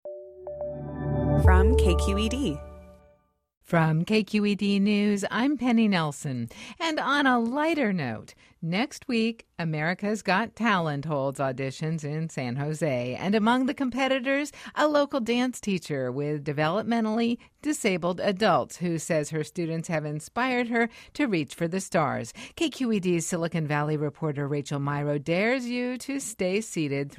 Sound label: Hip Hop Dance